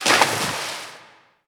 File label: Dolphin splash; RA3